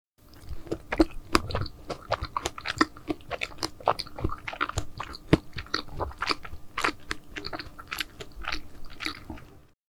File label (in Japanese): mogumogu